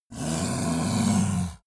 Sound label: yeti growl warning; kreaturen